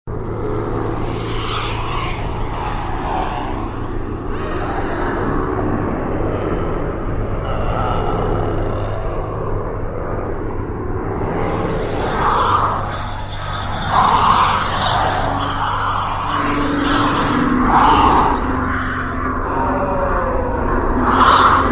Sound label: Atmo, ambient